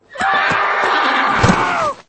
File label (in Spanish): caida